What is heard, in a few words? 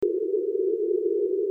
bark